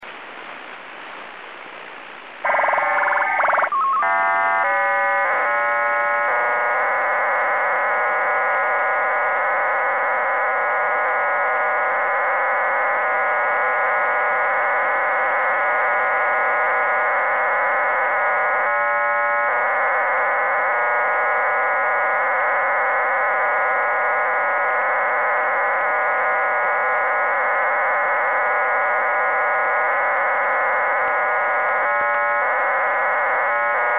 Cuban RDFT

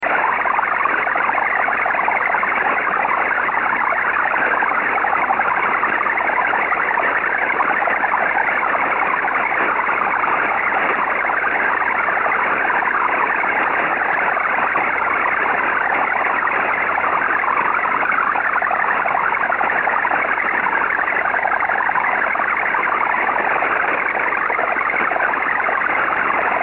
gurgle; The Havana Gurgler

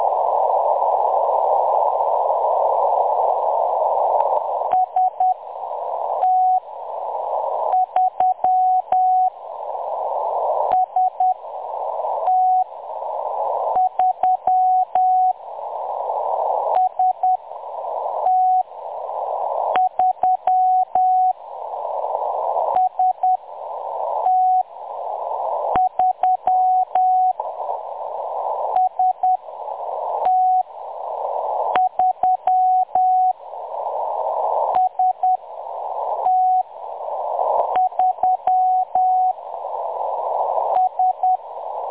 10184khz, morse; M23

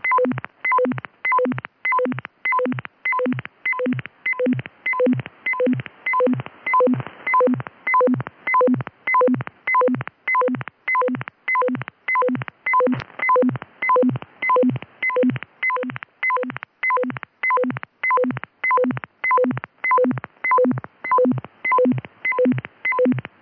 Tone sequencer; Unid tone sequener; N&O 250

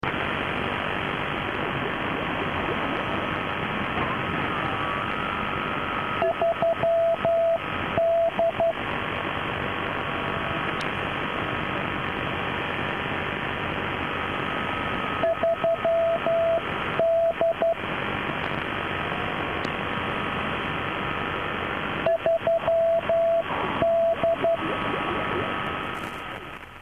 4200khz; Unid 3D, 1990-02-15, 0055 UTC

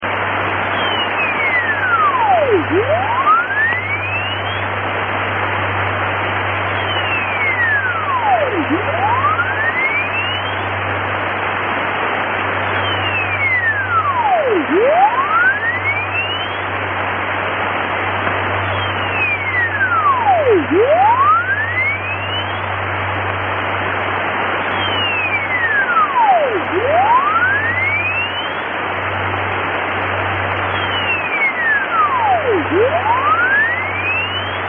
Oddity 7000 kHz; Unid sweeper on 7000 kHz. Single sweep. April 2012

